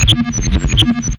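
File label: Sequencer, Hard & Loud Techno; USB Soundscan vol.01; AKAI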